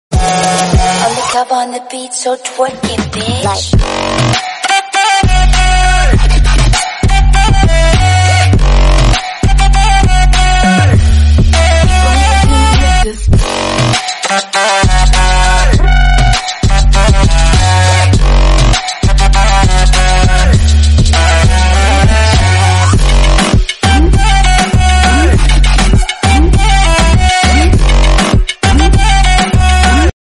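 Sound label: background music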